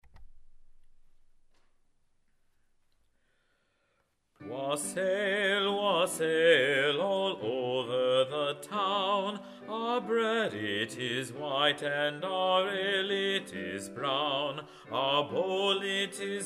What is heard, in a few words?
Bass; Gloucestershire Wassail, English Traditional